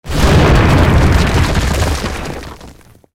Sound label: tanks explosion concrete; explosions; War Thunder